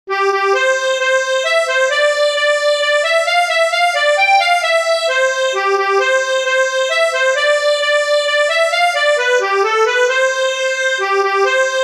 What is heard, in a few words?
Mazurka; Mazurka a Barnabe